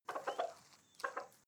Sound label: hens clucking short